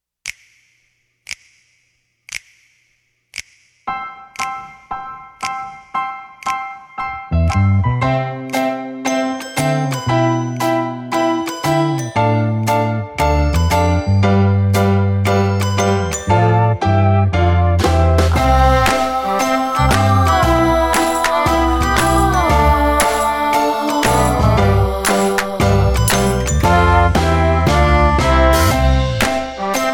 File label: Instrumental